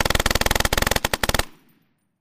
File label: M240 Golf